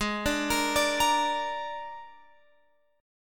Ab Suspended 2nd Flat 5th chord, Guitar, Open F tuning; Absus2b5; arpeggiated; chordshape